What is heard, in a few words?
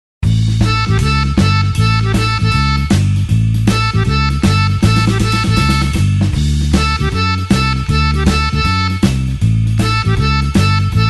blues harp shuffle A